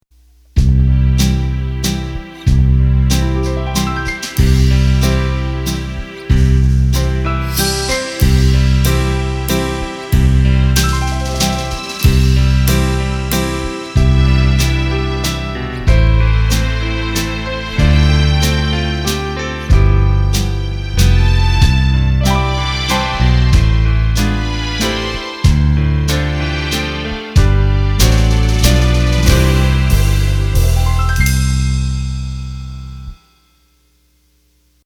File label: Slow Waltz, Roland accordion; roland bk7m